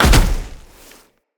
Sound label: Tenno Land Heavy Snow, Footsteps; Characters